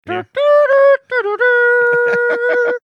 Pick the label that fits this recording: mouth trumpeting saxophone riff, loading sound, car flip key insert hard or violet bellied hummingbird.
mouth trumpeting saxophone riff